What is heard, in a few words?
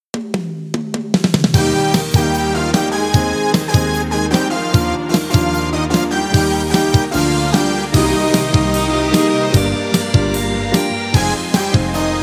radio jingle; Instanssi